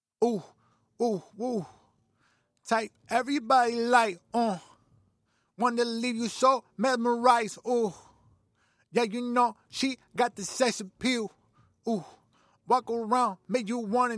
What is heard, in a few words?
Sex Appeal, acapella, Bm